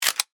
Multimedia Button, Multimedia, Element, Button, Click; BlastWave